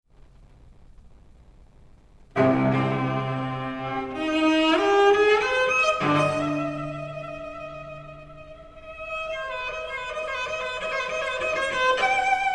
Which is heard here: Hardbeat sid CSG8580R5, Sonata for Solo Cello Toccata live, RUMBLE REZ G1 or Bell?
Sonata for Solo Cello Toccata live